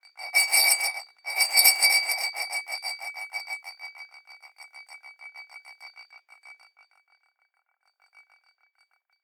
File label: Moving Metal Adapter inside of Metal Glass; Sample Growing Stream 002; Ear Candy